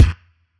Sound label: kick; goodtakimu drumkit; fl studio; drumkits